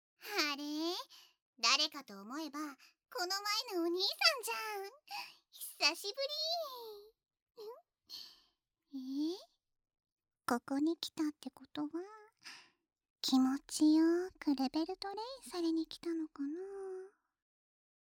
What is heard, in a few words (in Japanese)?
耳舐め,ASMR; 生意気サキュバスなんかに負けない; 耳舐めなんかに負けない